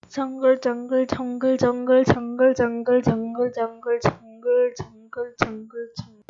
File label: jungle